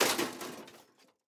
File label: metal break; teardown ps5 windows xbox series xs gamerip 2022